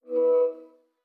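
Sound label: video call invitation accepted; i OS; iOS10; nano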